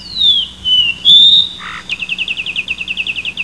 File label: ping sound